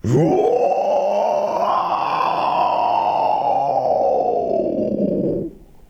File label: uoooo; 唸り; 化け物の唸り